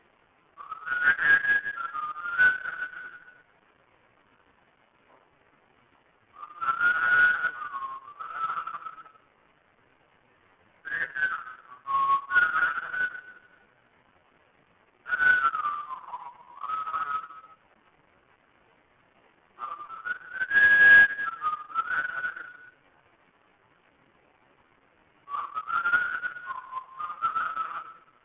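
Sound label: whistleing at the house again no one there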